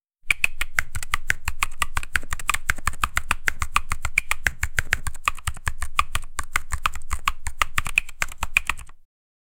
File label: typing on a soft squishy